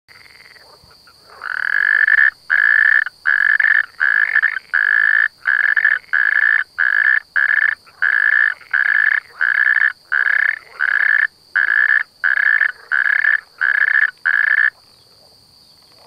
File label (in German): rufe kreuzkroete